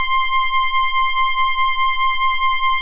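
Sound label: C5 ANA PAD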